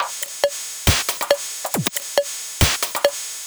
Bpm138 Top Loop; Polyform; Dubstep

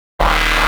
122 B#m Sfx, electro synths; loop; Prime Loops; Complex Electro Synths; Specters

